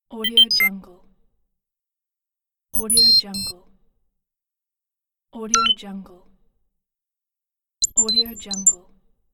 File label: scifi gadget; productionelements